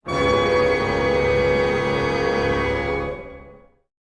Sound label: Hit Sting; Up game soundtrack; macOS PC FX PS2 PSP gamerip 2009